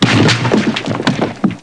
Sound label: ROCK; PC Gamer 4.2; mtm2demo